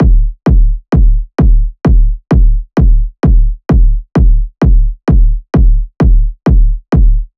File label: Kick Loop 130, Techno